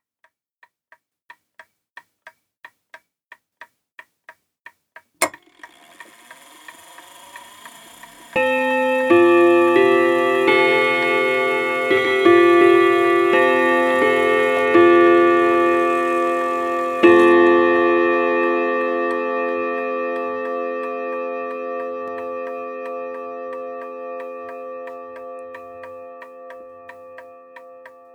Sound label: Group Bell Clock